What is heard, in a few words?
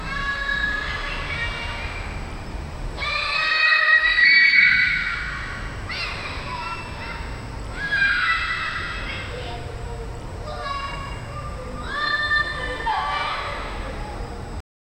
muffled laughter and play, playground